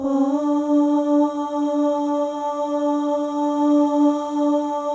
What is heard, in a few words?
OH, FEM, Symphony of Voices